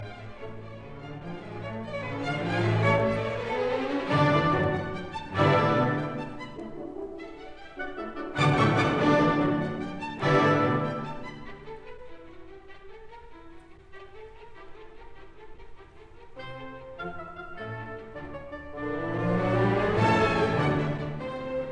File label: Symphony in D major Scherzo Trio; Op 36; Bruno Walter